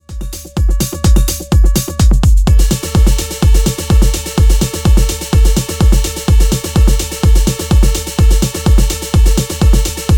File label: Alarm Call